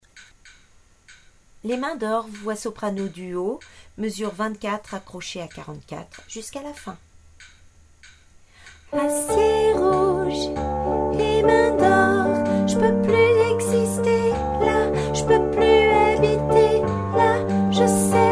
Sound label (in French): soprano